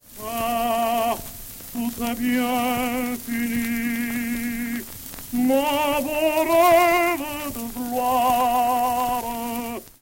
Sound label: click